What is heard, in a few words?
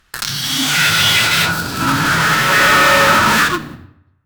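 bonecrushing fart of a giant; grotesque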